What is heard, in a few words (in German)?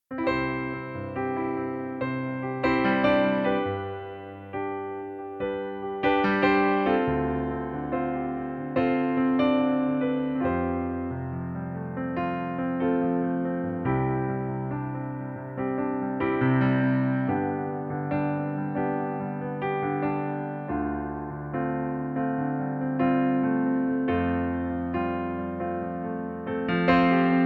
Pianoplayback C-Dur